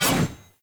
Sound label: Sword Whoosh Heavy